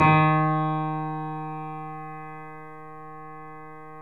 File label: D#, Boesendorfer, 3D Pianos; E-MU Producer Series Vol. 5; 3-D Audio Collection